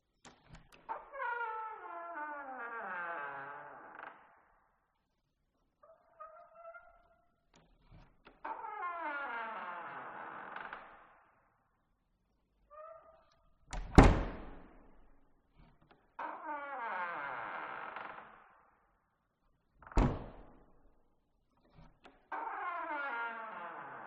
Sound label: Door Abandoned Mansion Hallway Perspective Reverberating Old Wooden Door Open; Skyes Audio